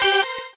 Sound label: normal hit normal